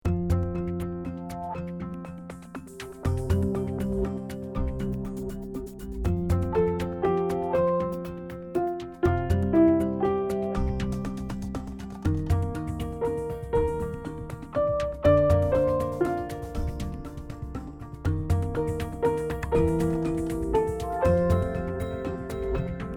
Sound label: music